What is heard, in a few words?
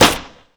garg claw; slender